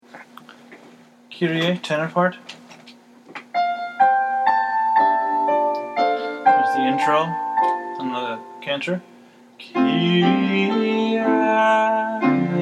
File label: tenor; kyrie mass xvi